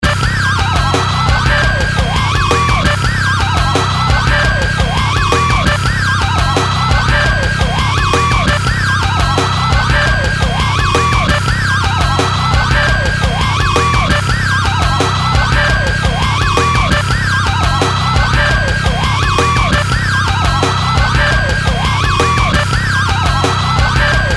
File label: loop; tatteredandtorn